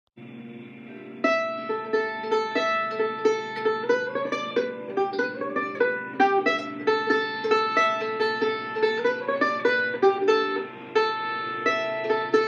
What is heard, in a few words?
Snipe IIs Fancy in A mixolydian